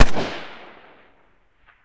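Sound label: percussion Ruger, guns tuned; Roland - Rhythm Section; Guns & Glass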